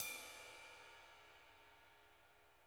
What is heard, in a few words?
ride, drum kits